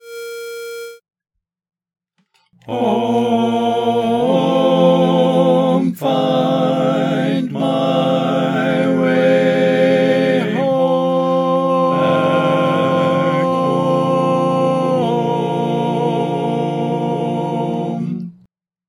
tenor

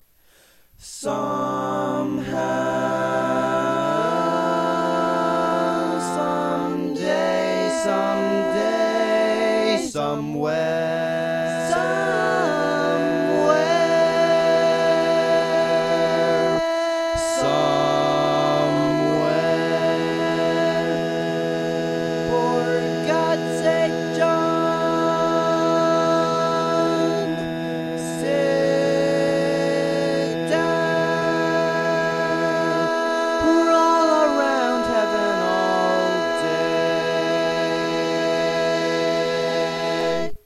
Bass, barbershop

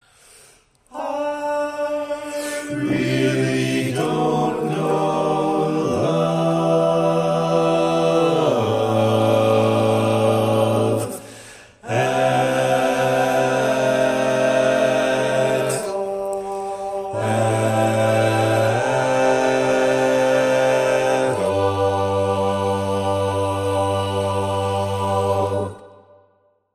bass

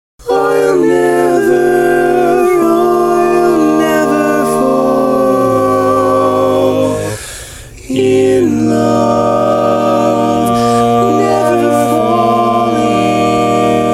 All Parts, barbershop tags

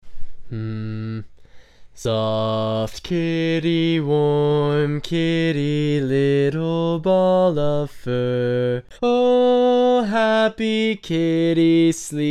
Bass, Barbershop Tags

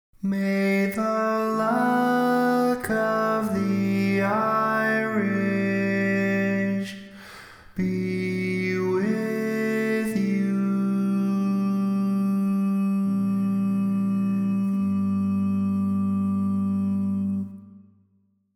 Tag, Lead, barbershop tags

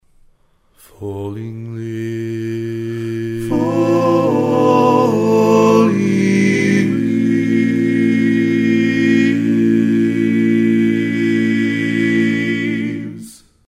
All Parts, barbershop tags

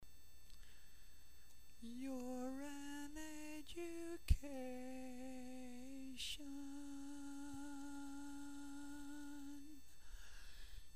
Tenor, barbershop

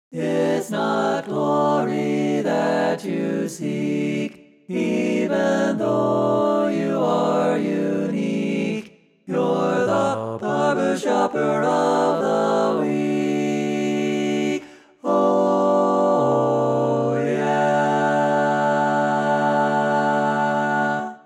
Tenor, barbershop

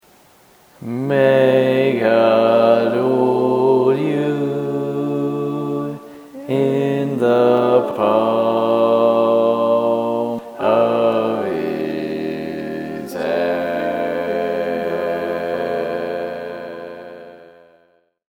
Bass, barbershop

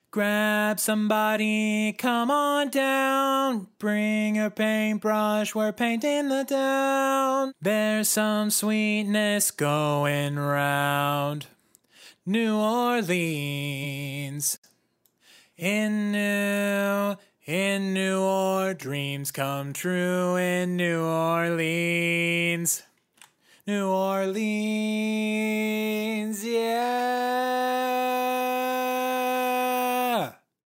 Bari, Barbershop

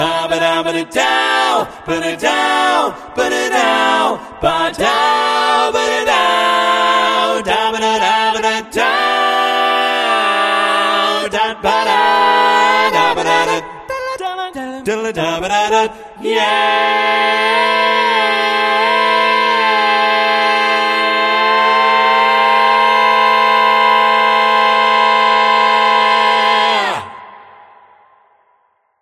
All Parts, barbershop tags

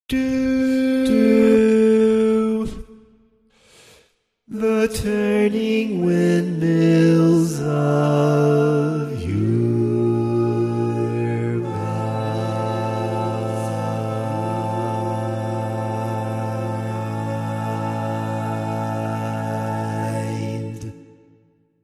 Bass, barbershop

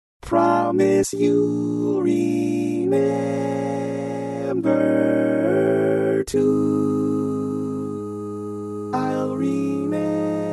Tenor, barbershop tags

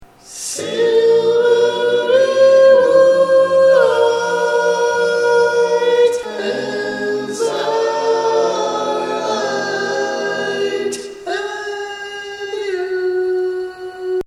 barbershop tags

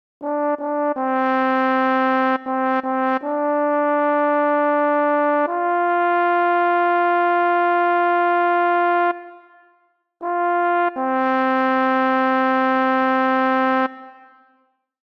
Tenor, barbershop tags

